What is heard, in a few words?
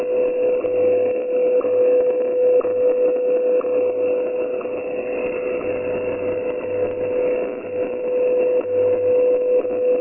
wwv nb on; icom ic7600